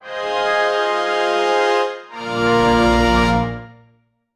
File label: short triumphant fanfare